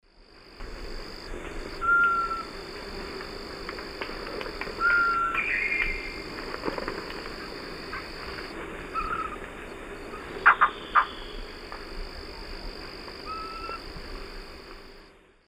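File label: Thamnophilus aethiops, calls female and juvenile; S. America; Eastern Lowlands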